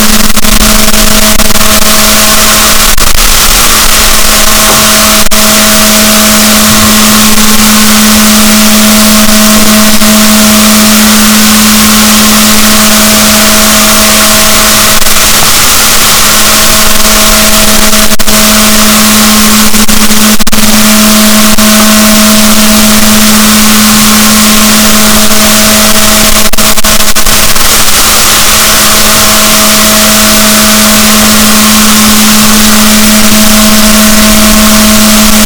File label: rorquals negatif, boat